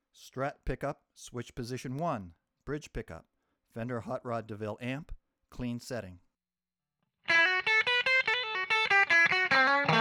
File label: strat bridge hotrod clean